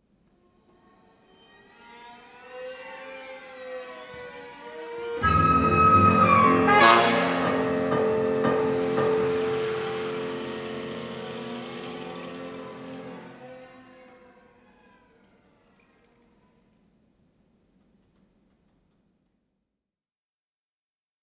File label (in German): Fluss; KR; Ziffer 3